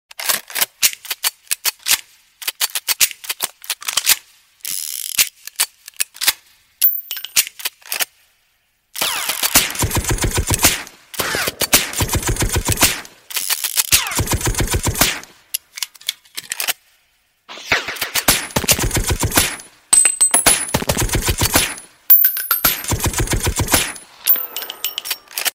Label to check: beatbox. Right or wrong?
Right